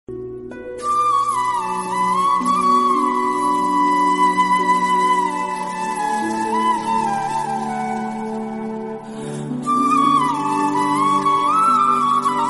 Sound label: flute music tone ringtone